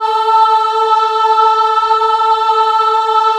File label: A3 WOMEN AH R, Choir; USB Soundscan vol.28; AKAI; WOMEN AHP